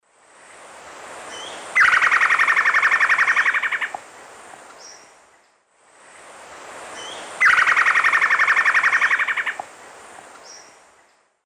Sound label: Myrmeciza melanoceps, alarm call; S. America; Eastern Lowlands